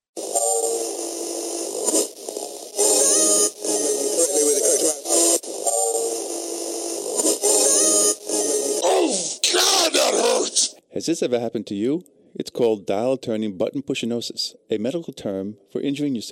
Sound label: Dial turning button push